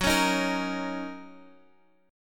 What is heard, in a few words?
Gb 6th Gb down Guitar Standard; strummed; capo 2